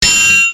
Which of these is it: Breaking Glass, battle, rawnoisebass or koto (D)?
battle